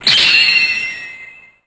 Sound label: Shining; Zenonzard